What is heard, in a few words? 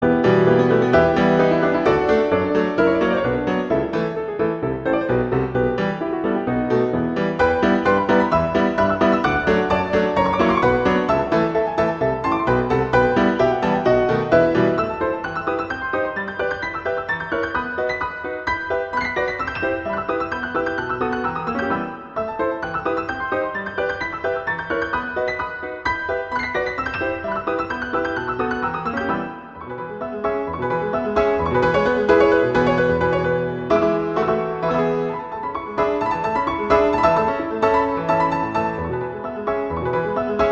Allegro; Brahms; Hungarian